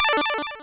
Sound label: Arp, Stab & Hit; Bitkits